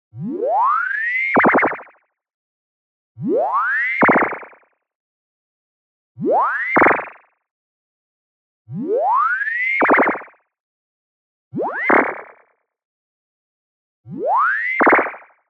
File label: tonal, smooth oscillator sweep bubble; boom library; Anime Essentials